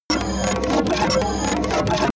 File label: slurp pump, science fiction